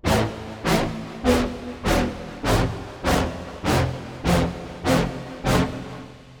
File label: keys brass stab; octane